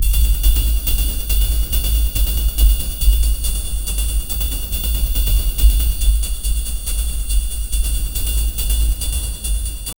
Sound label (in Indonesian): suara latar live tiktok, social media